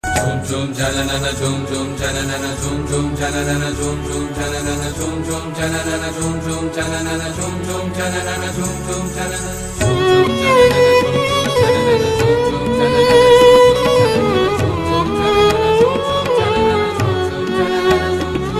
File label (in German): violine